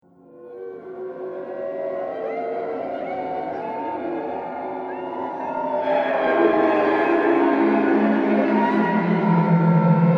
Purgatory Soundscape, Scary Soundscape Sound Effects